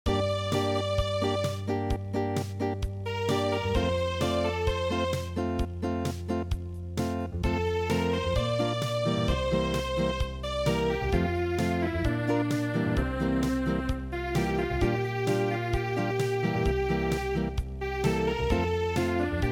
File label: Unplugged